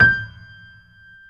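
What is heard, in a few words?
G6, grand piano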